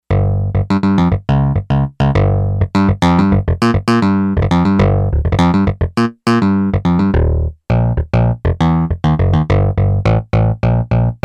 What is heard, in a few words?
E.Bass 2, Yamaha DX11; Factory Preset Voices